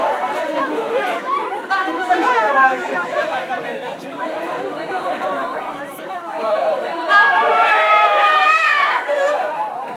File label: joyful indistinct voices, young people